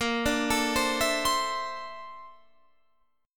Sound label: A sharp Major Sharp 11th, down, Guitar, Open F; arpeggiated; chordshape